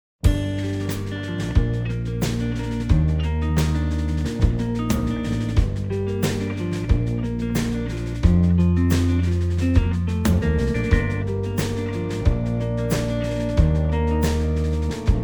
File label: Drums